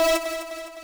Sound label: lead e4